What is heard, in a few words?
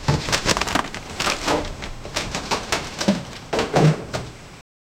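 rapid footsteps and excited, commercial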